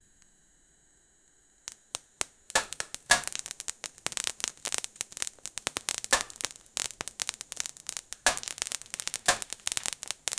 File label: flour being floofed aroun, kitchen